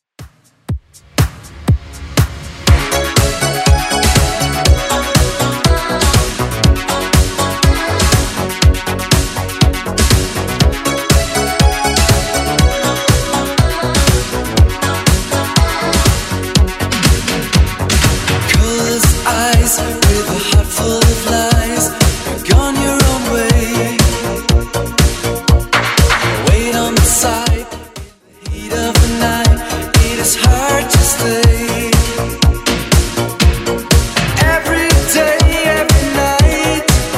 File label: 80s 121bpm; Redrum